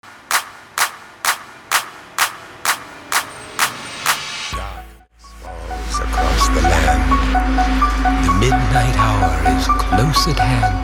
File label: Halloween Intro 128 BPM